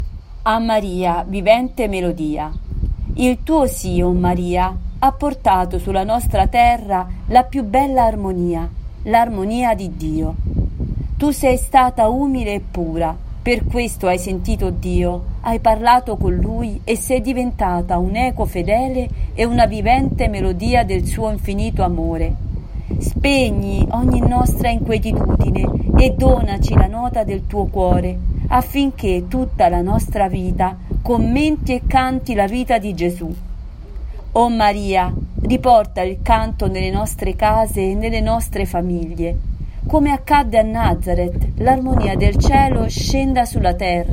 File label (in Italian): A Maria vivente melodia; 100 Preghiere